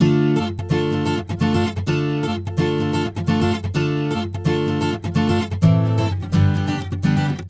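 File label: Acoustic Latin Guitar 128BPM D minor; Acoustic Latin Guitars; Vengeance; Essential Clubsounds Vol.4; Guitarloops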